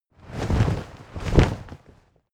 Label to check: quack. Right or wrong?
Wrong